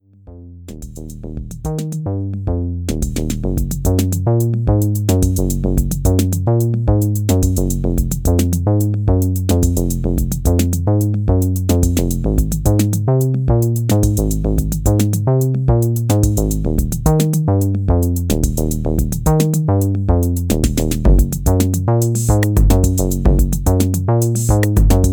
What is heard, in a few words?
Study for Arp and other synthesizers